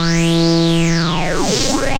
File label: Moog Fx; Zero-G Jungle Warfare 1, 2 & 3; 90 s Sample CDs